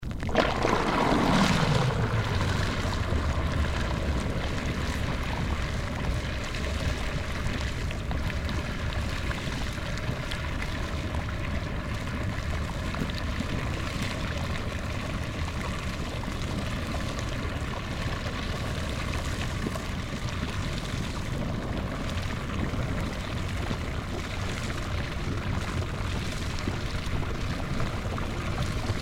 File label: Geyser Hill Anemone Geyser Draining; Sony M10